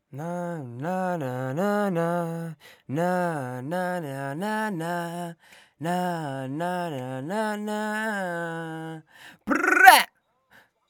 train adlibs

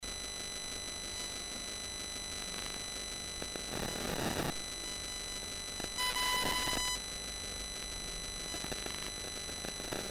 digital noise, Highpitched whining noise; whenever I record at my home through mic

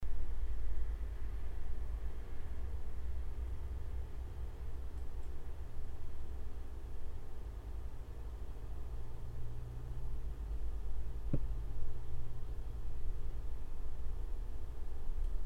hum foil, low end rumble in mic

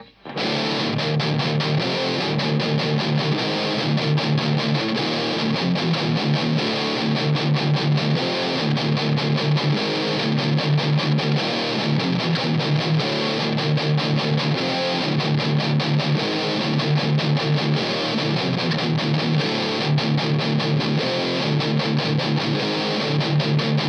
low vol hi gain

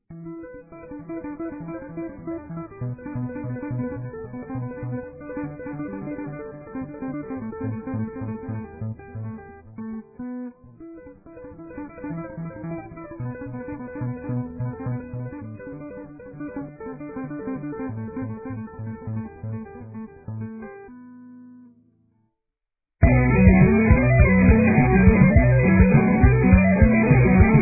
guitartap

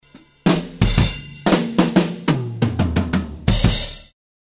drum snipet

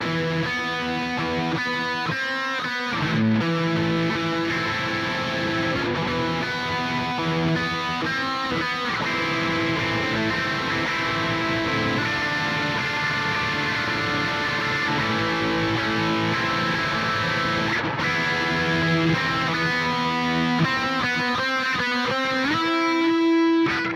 holding loop, heavy guitars; mixing heavy guitars

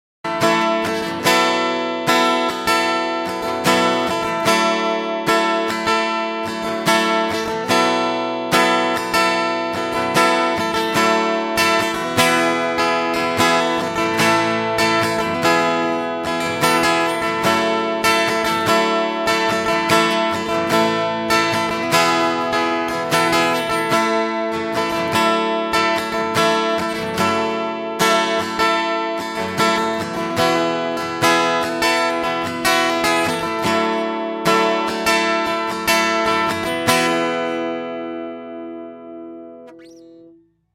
acoustic

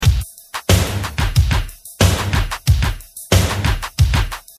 snare; really high octane snare sound

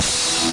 crash

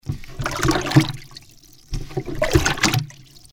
paddle; RNC as a mastering compressor

